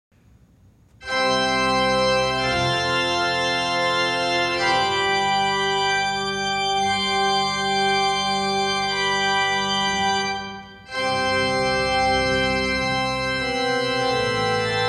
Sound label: Full Sw reed, organ